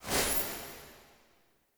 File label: Note Fall; UI; Minigames; Pied Piper